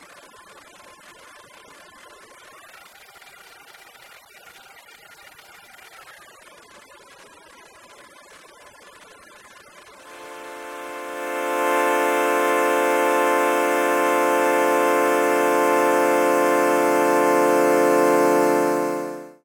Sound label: Boss DE-200 Digital Delay Sync Juno-6 Overdubbing Pitch